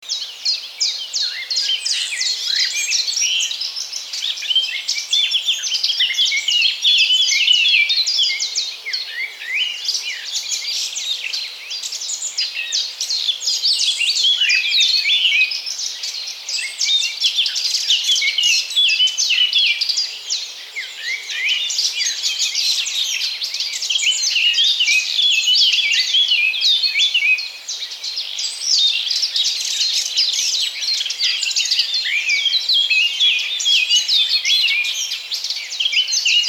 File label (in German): Vogelstimmen im Wald